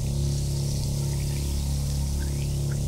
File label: Vortex